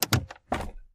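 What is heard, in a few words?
sedan glove box open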